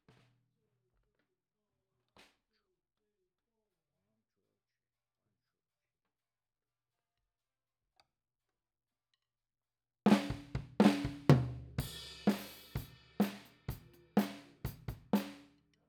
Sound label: KIT